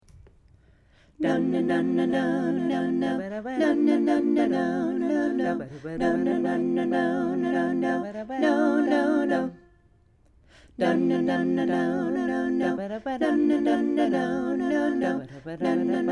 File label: Two Chord Ex Alto